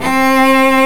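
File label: strings solo, large ensemble; String Master Series; Combos 1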